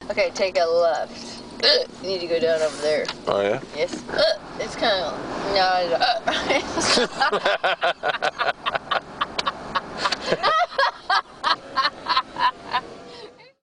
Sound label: hickup